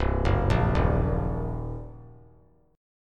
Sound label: C Minor 7th Flat 5th, chord; Cm7b5 arpeggiated; JGuitar